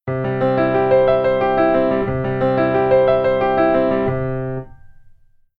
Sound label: Keyboard AP MONO